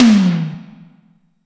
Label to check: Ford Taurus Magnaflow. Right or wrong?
Wrong